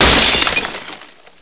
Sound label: sml, impact glass; SINDEMO